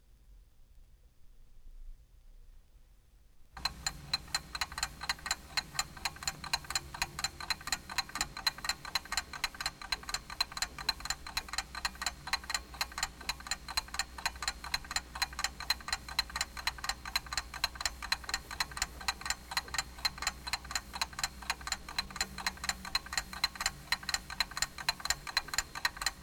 Cheap Alarm Clock Ticking; Jac Holzman; Authentic Sound Effects Volume 2

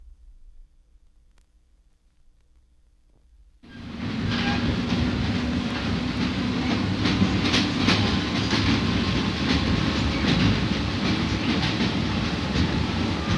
Railroad Car; Jac Holzman; Authentic Sound Effects Volume 2

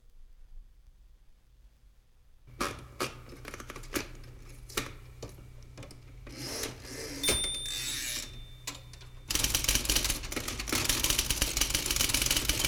IBM Electric Typewriter; Jac Holzman; Authentic Sound Effects Volume 2

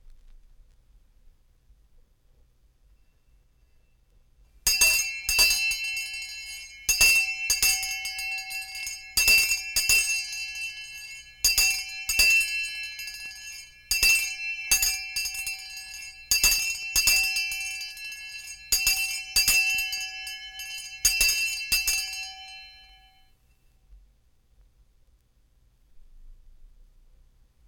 School Bell Or Town Crier Bell; Jac Holzman; Authentic Sound Effects Volume 2

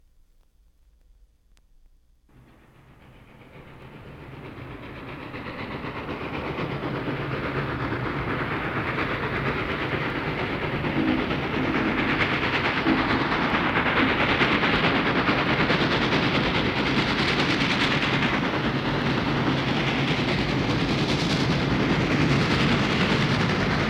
Steam Train Passes; Jac Holzman; Authentic Sound Effects Volume 2

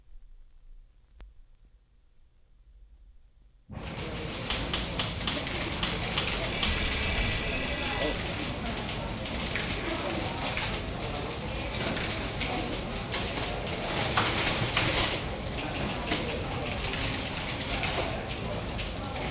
Busy Office Area; Jac Holzman; Authentic Sound Effects Volume 2

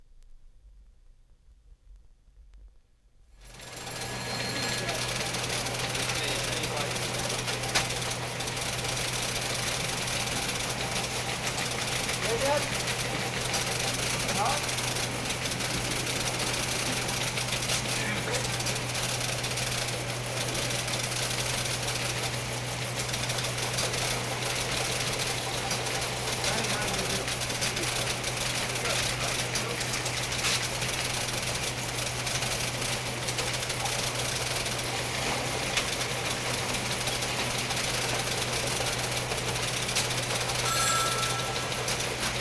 City Room; Jac Holzman; Authentic Sound Effects Volume 2

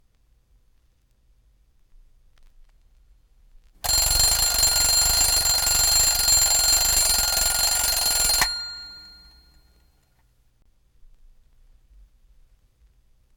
Alarm Only; Jac Holzman; Authentic Sound Effects Volume 2

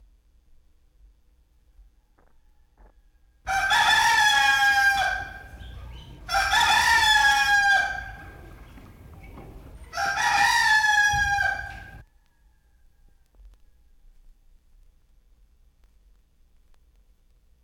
Rooster Crows Three Times; Jac Holzman; Authentic Sound Effects Volume 2

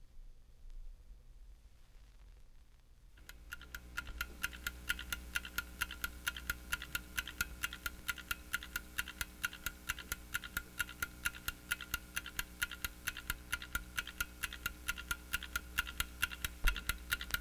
Small Clock Ticking; Jac Holzman; Authentic Sound Effects Volume 2

